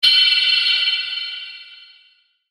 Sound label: element ice; maoudamashii